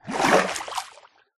jump, dolphin; mob; Minecraft

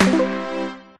finish line 3rd; Minecraft

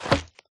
armor, turtle; mob; Minecraft

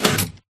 out, piston; tile; Minecraft

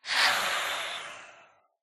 idle, phantom; mob; Minecraft

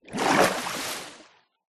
exit, underwater; ambient; Minecraft

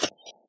creeper; mob; Minecraft

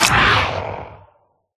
bite, phantom; mob; Minecraft

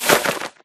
takeoff, bat; mob; Minecraft

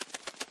fly, parrot; Minecraft

